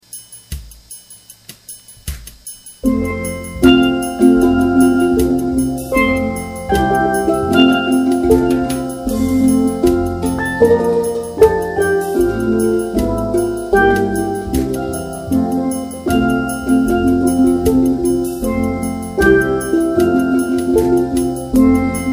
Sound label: bridal march reggae, steel drum band; Wedding March; (reggae style)